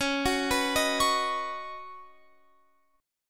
Db 7th Suspended 2nd Suspended 4th, Guitar, Open F; x,20,18,18,18,20; Db7sus2sus4 arpeggiated